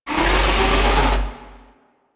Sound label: Shell Pillar Spin; Warframe; Corpus Railjack; Comet Shredder